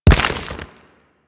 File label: Nechro Mech Step Surface Dirt; Step Surfaces